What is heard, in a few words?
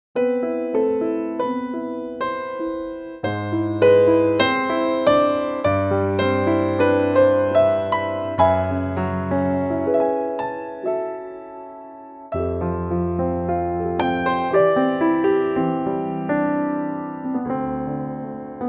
Am piano